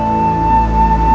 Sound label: ORCH, STRINGS; STRINGS3